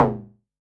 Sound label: Analog SY1 Perc